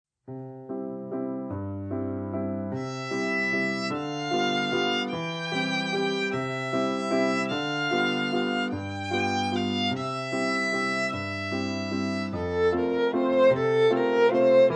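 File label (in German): Frühlingswalzer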